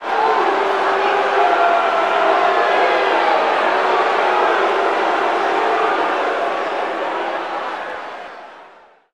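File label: Basketball Crowd Boo; Crowds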